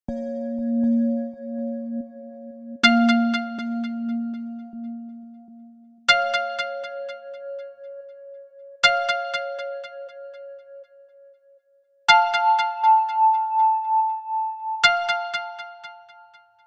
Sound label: Chirp Ringtone; LightWave; Chirp Can; Ringtone Records